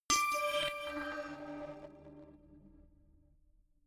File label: notification glockenspiel crazy single low; instrumente